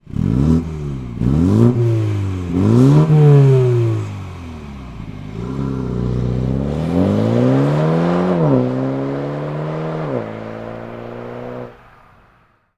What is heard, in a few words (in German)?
Mini Cooper S F55-F56-F57 SERIE